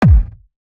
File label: drum